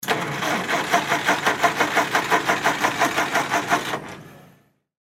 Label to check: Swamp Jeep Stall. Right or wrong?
Right